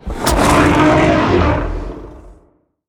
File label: dragons roar; sfx; tyranthor